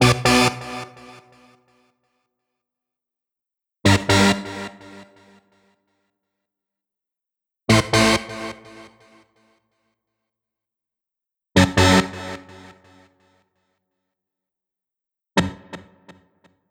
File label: Deeper 125bpm